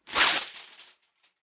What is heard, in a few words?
Foley Tin Foil Tear Sheet Rip; Kitchen (Sound Effects:Household)